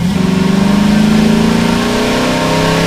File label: first, vehicles v8; Source Engine; video game